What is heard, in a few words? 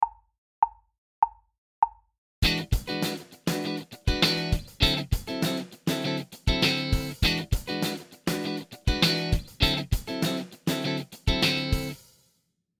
Funk Accenting E9 D9